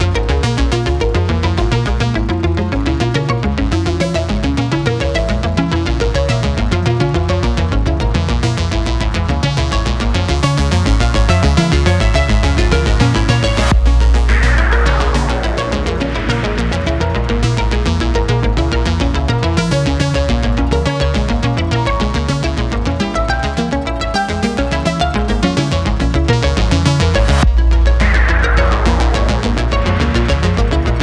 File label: Dia Tense Loop; Galaxy of Pen & Paper